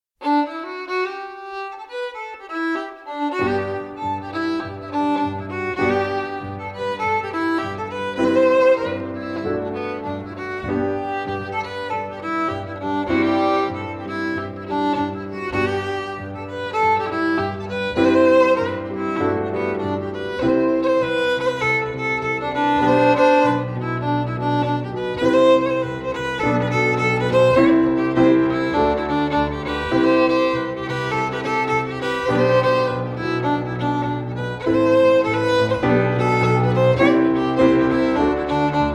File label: Jig, fiddle; Clare